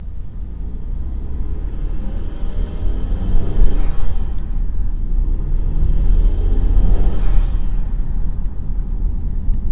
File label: low rpm rev 3k max, mazda; forge motorsport bypass valve